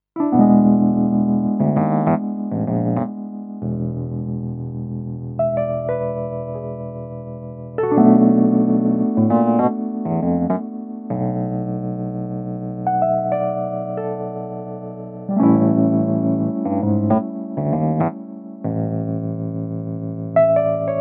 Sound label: Roland FP90 A200 EPiano